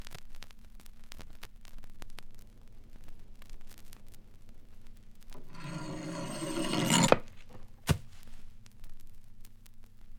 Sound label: the guillotine